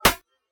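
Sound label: slap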